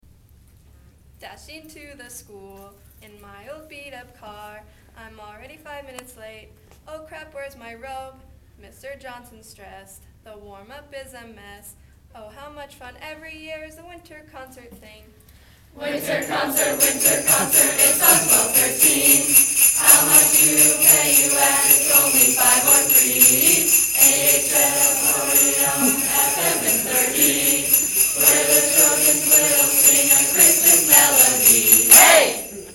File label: Jingles